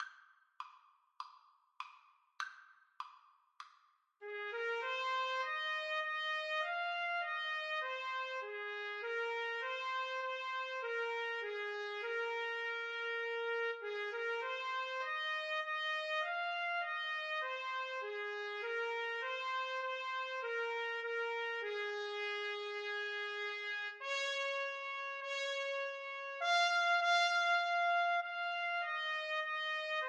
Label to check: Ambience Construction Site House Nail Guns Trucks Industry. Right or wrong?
Wrong